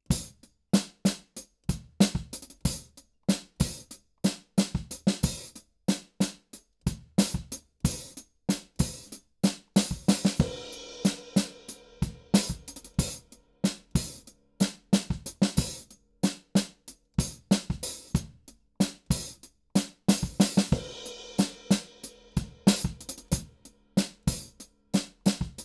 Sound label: KIT